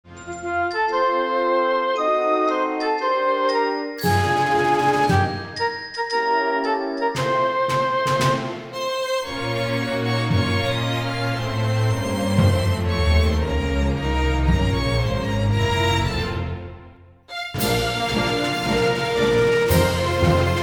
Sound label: Anthem France